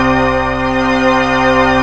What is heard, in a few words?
BELL FLANGE